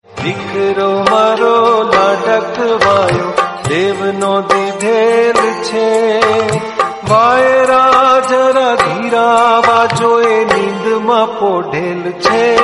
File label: gujarati